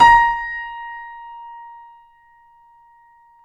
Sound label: KEY, YC7; Rhythm Section